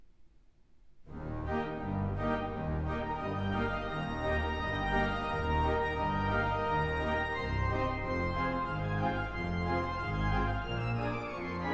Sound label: sortie in e flat major